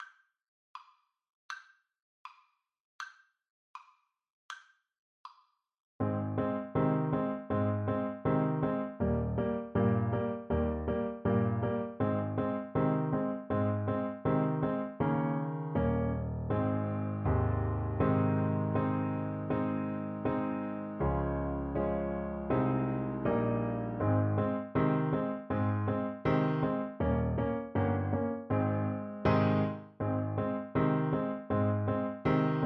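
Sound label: camptown races, alto saxophone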